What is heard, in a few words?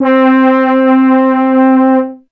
Ensoniq ESQ-1 Brass Ensemble C4